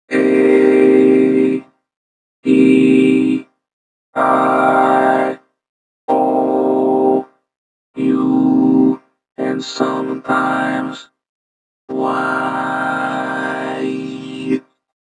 AEIOU, Vocoder Vox; E-MU Producer Series Vol. 8 – Platinum Phatt

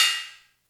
Cymbals, Elektron MachineDrum SPS1 MKII

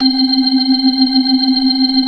FAST VIB, ORGAN, TREMOLO VIB

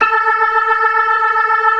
ORGAN, 4 DRAWBARS; Keith Emerson Signature Series

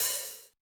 HH, Yamaha DJX 2B

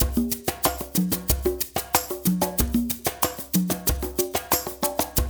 percussion loop; InVision Interactive; Percussion Slam; PROGFROG

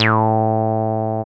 BASS; X-Static Goldmine 1

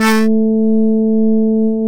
FM SYTH; NorthStar - The Wizard VOL-2; TURBOTRONIX

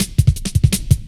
light stepz cut, drum loops; Zero-G Jungle Warfare 1, 2 & 3

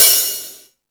Jazz Drums, E-MU Pro-Cussion; E-MU Pro-Cussion-1

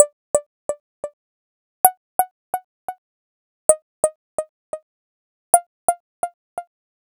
Synth Arpeggio, Synths; Eclectic Electronica

